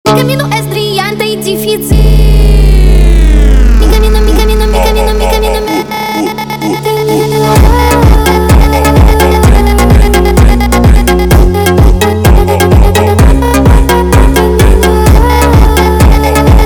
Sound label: Funk sped Up